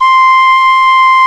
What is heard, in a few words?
PENNY WHIST; E-MU Producer Series Vol. 2 – More Studio Essentials; Composer; Whistle Combo